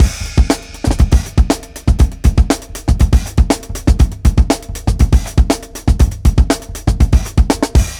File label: Big Brown, Breakbeats, Drum n Bass; KJ Sawka; Drum n Bass & Beyond